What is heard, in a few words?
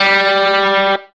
electric guitar